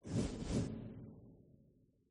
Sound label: woosh